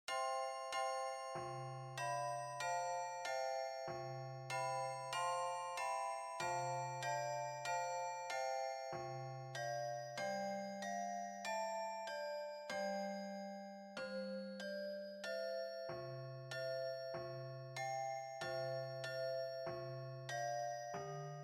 Children Heavenly Father 2 octave w bass simple handbells